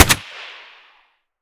mr153 fire silenced distant; Escape from Tarkov

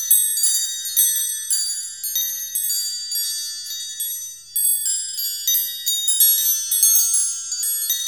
windchimes; Call of Duty Modern Warfare

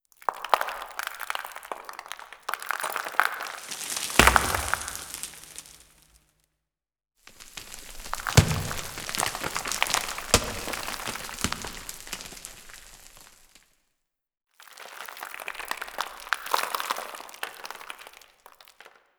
rock debris verby loop; Call of Duty Modern Warfare

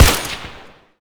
polycraft 3 shot

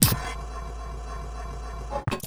arm slow

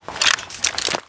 Kriss reload first lift, foley; Call of Duty Modern Warfare

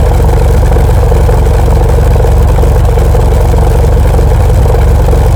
Aircrafts Engines Packard Merlin Prop; DCS

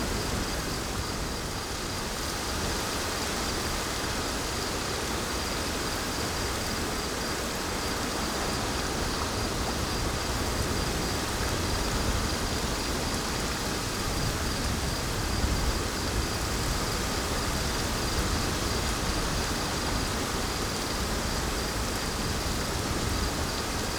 ambience forest night windy rainy; Rust

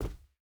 footstep boots cloth jump land; Rust

